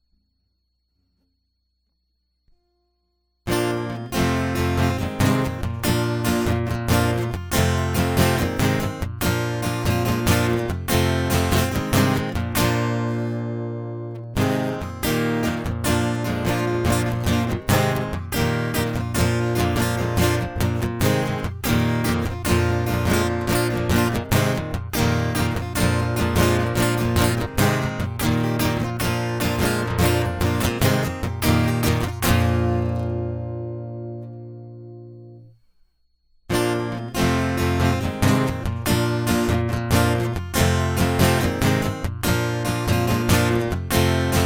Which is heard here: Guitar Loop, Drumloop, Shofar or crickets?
Guitar Loop